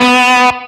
Left-hand vibrato; osu

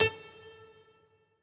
piano riff intro

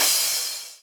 soft hit finish

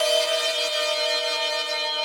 synth main

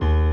piano low

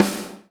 soft hit clap

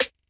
fill kit

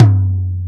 Tom BD

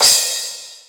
soft hit finish

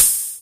ride

